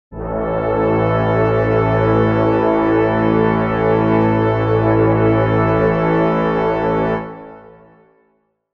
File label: brass chord a maj